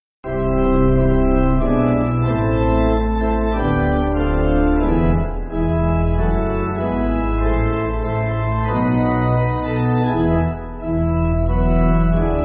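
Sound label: Pipe Organ, church backing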